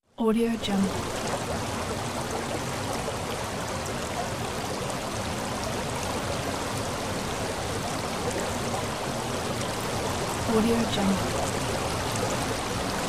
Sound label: forest stream